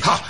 "ha", male; ooh ahh

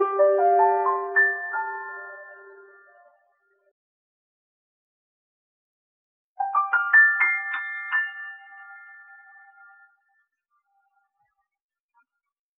piano snow falling slowly, Sad; Loops

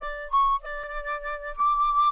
flute, Jazzmatazz loops

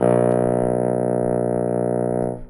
farty, Horns; Notes

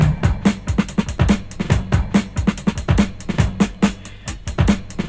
Drum loops Funky

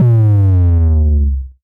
moog, kicks heartbeats

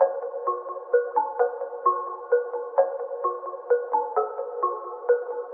ping pong me, Loops Electronic

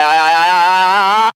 "ah", male

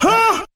"huh"; Voice; ooh ahh

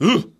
ooh, Voice

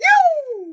"yoo"; Voice; ooh ahh

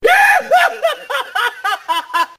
laugh

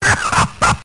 laugh demon

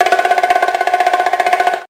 bongo roller, Drum loops, Rolls

